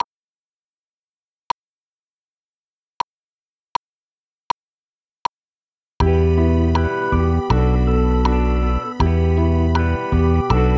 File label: Keyboard